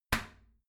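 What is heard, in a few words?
toilet seat lid close regular, bathroom; haus-garten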